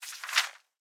open flip, book; item; Minecraft; Votive World

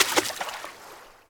swim, liquid; Minecraft; Votive World

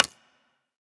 click stereo; Minecraft; Conquest Default

